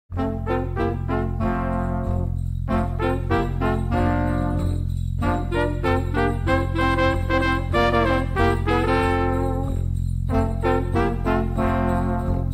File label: jingle bells jazz